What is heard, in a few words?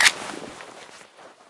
pistol clip out; Left 4 Dead; Source Engine